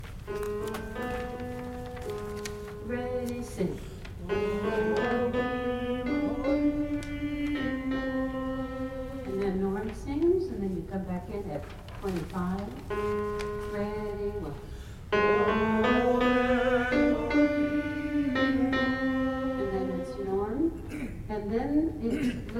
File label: bass and tenor